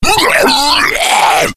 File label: death, slender shiver